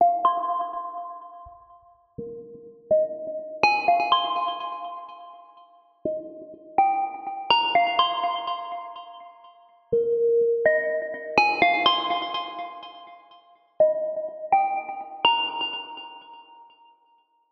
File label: Tiles Rev